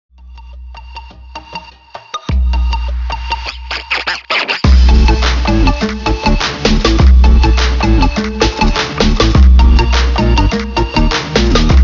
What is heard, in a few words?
Ringtone